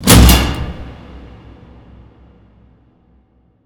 impact hatch; Hits

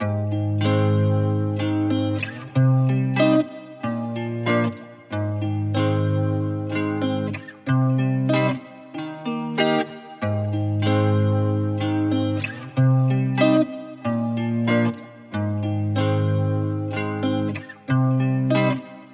94 BPM C Min, Miami Guitar; Serenade; Cymatics

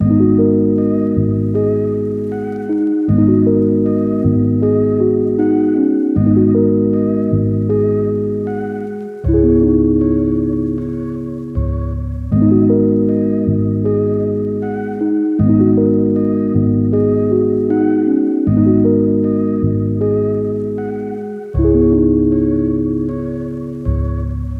78 BPM G# Min, Lofi Melodies; Winter Bliss; Cymatics; Lofi Starter Pack

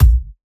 Bassdrum, dubstep and trap

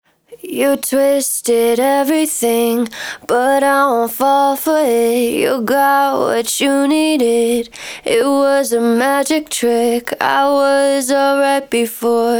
Alley Main Vocal Dry 100bpm D#min; Acapella; Ghosthack; Vocals; Essential Bundle Acapella Freebie; Acapella Stems

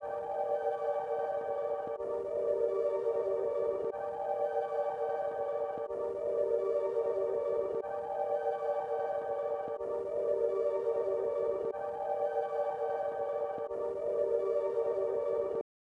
123 BPM G Min Pad, melody loop stem; Loop Stems; God Fearing

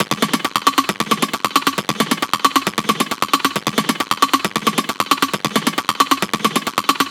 Perc Loop 135, Techno

